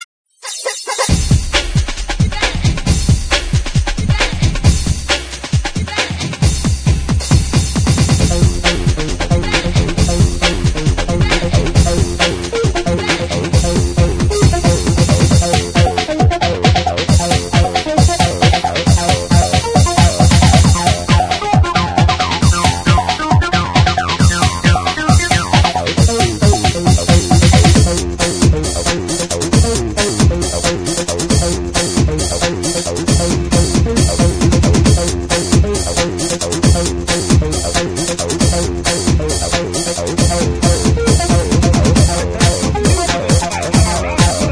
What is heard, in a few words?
Drum